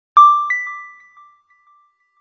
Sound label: Message, Nokia alert tones; Asha 300